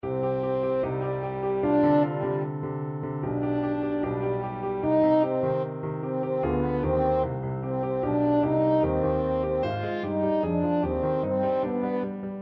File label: dies irae, french horn